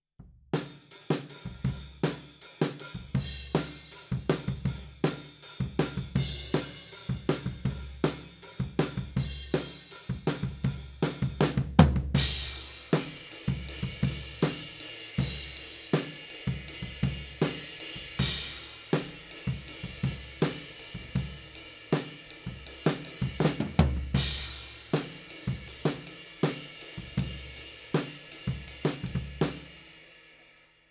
Floor, 160 BPM

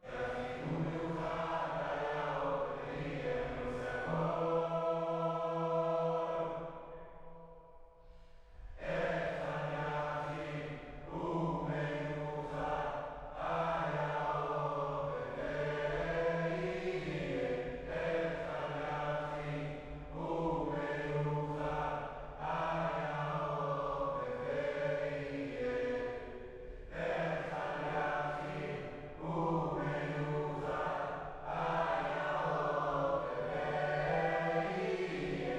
Choir

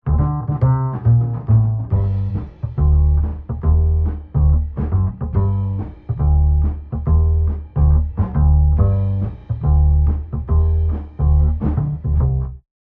Bass, Jazz for piano

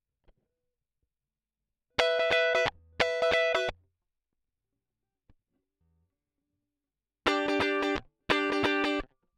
Guit Solo Line, RNR for piano

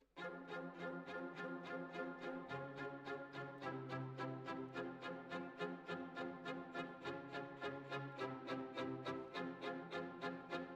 Violin Solo

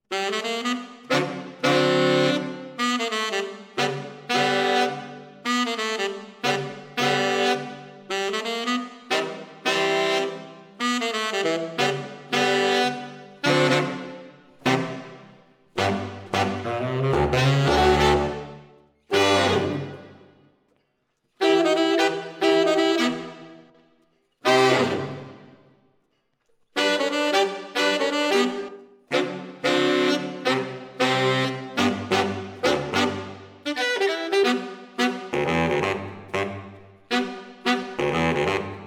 SAXES

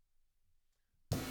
Drums

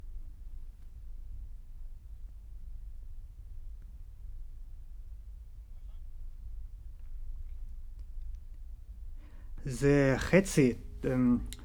Tape GTR

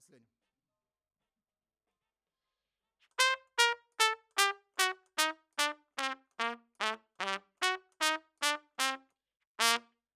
Trump

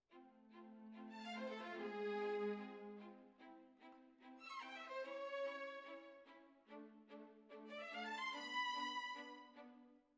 Violins Over

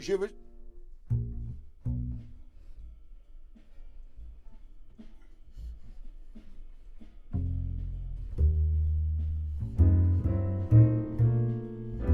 Bass Bot

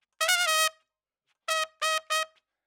Trump; RE20

